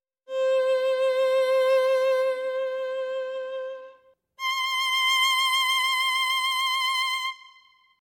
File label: C Up One Octave